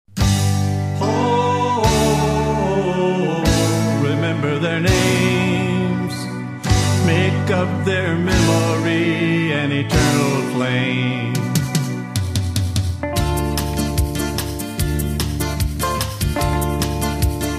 vocal

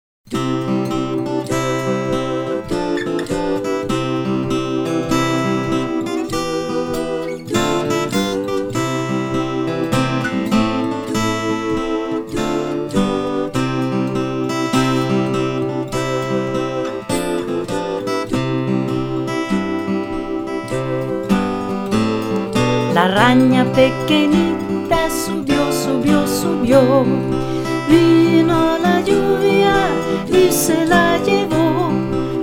la arana pequenita vocal; la arana pequenita spanish song

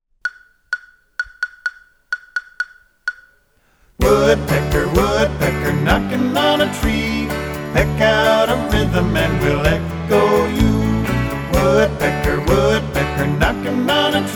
woodpecker

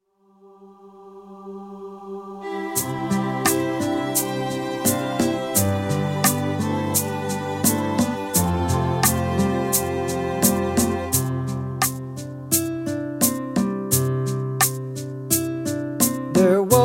howls and hoots, drills for sounds song

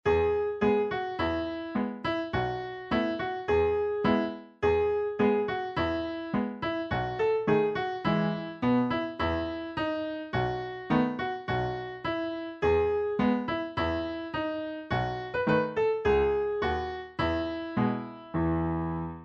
un elephant ca trompe instr

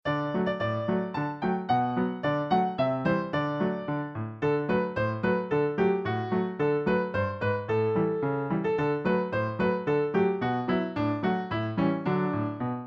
old joe clark instr, Traditional Folk Song

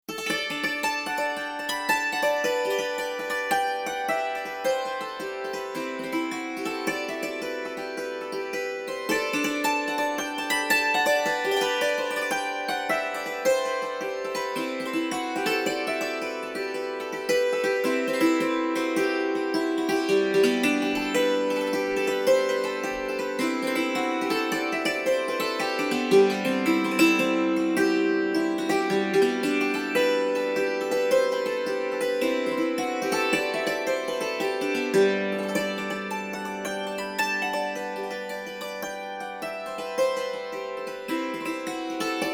rounded glass jig

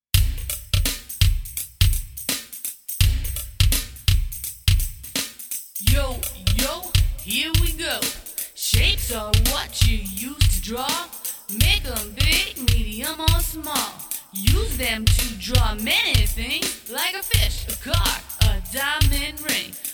basic shapes rap; musical play

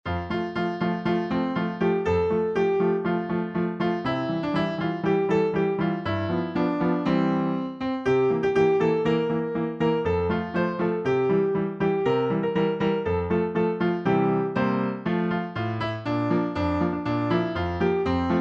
la mere michel instr; French

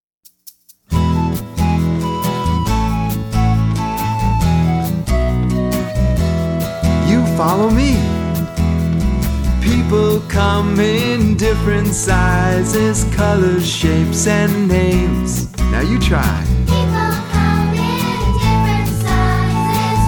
kids peace song

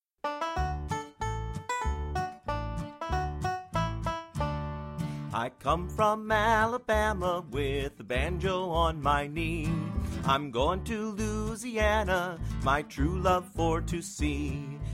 oh susannah vocal; folk

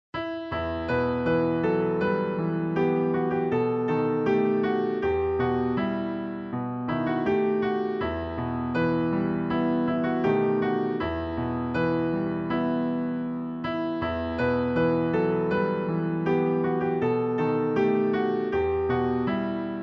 la petite poule grise instr; French

